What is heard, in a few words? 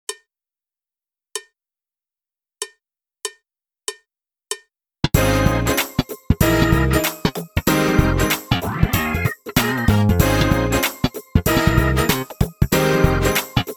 Gospel Music Loop drumless